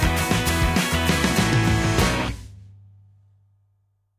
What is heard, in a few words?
Victory Sting